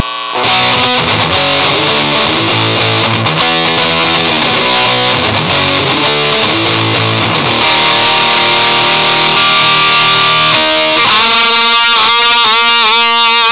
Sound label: tonebender Pro MKII